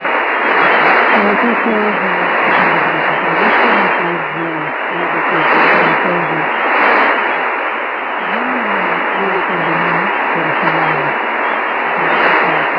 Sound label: Tanzania 5050KHz